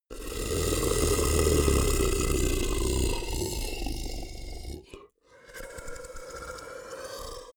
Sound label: beast grunt warning angry; comedy-cartoon; soundpool